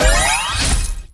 Coin Bag; Subway Surfers City; Android, iOS

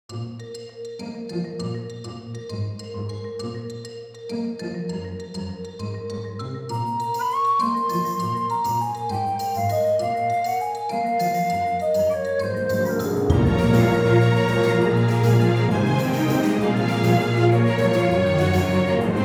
theme river; Cris Tales; PS4 PS5 Stadia Switch Windows Xbox One Xbox Series X/S

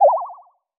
Result NG

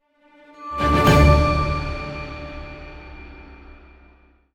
stinger Level Up

